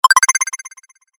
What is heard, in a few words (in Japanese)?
決定 高音 レトロ; モノラル